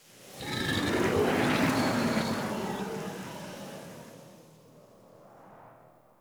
Wind Gust Calm Toxic Throat E, Ambience Infested Eris Misc Outdoor